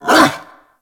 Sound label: locker open bark single, Kubrow; Enemies; Warframe; Tame